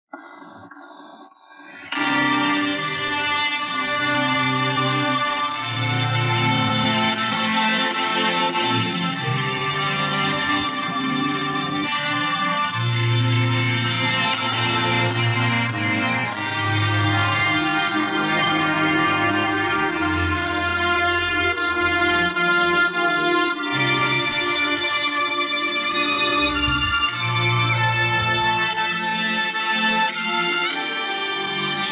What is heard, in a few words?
Synths and etc